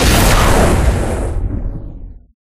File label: Down; Life of a Naomi